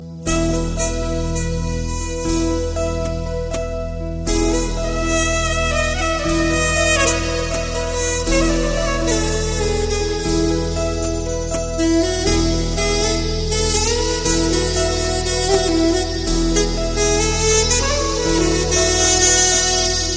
Tune Duet

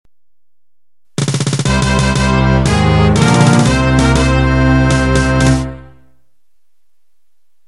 Victory; Ff9

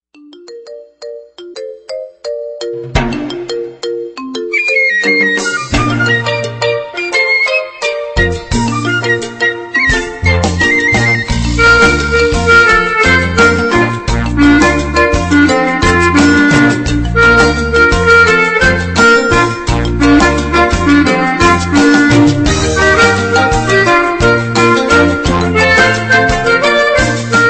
Carribean Tone

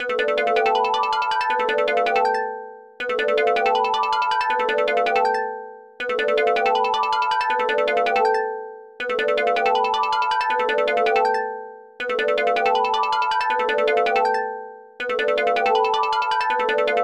Alert; Acadia NE

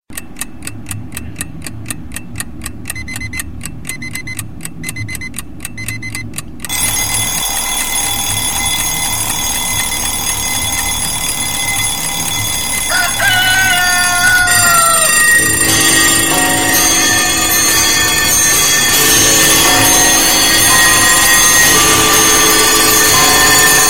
Clock

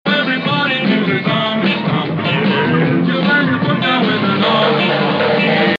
Zombie Stomping

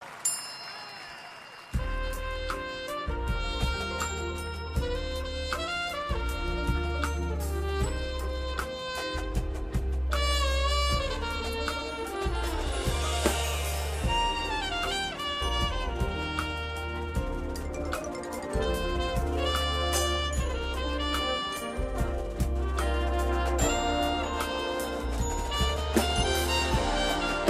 Ballad

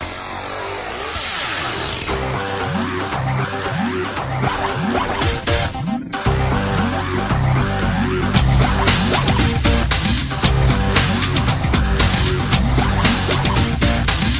No vocals; Digimon S1

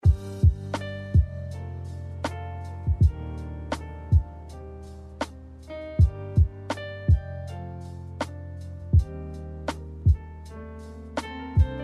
Chill Ringtone

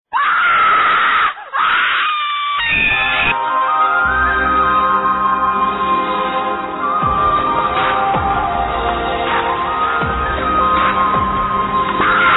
Horror; Airtel

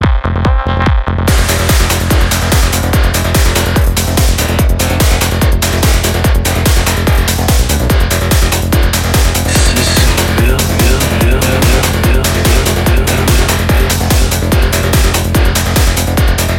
Psychedelic Ringtone

